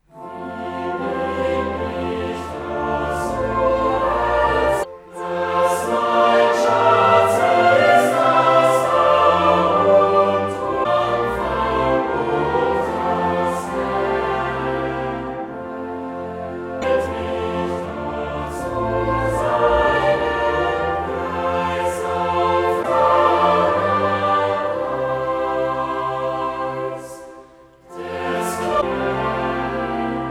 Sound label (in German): Knabenchor, Choral; VI Wie bin ich doch so herzlich froh